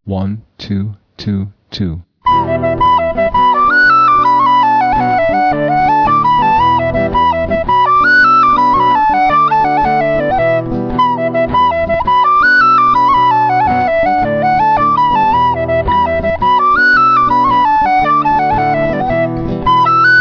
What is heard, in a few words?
jig; killavil